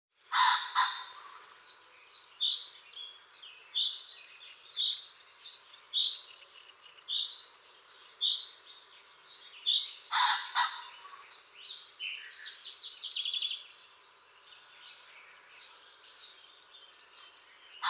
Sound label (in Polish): bazant